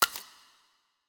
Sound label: hand change; uno